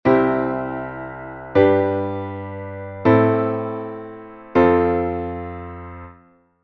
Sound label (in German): Akkordfolge Pop